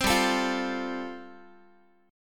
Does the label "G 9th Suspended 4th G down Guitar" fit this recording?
Yes